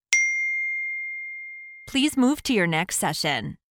single chime please move to your next session

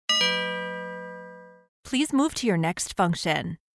join meeting please move to your next function

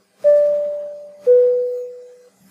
airplane chime

